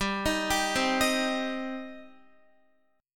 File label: G Suspended 4th Sharp 5th, Guitar; Gsus4#5 arpeggiated; x,10,13,12,12,10